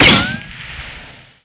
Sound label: Shoot; Skylanders Cloud Patrol; Arcade, iOS, Mobile; Bouncer SFX